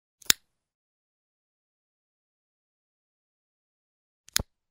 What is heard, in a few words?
Piezoelectric lighter; fire